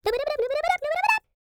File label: SCAT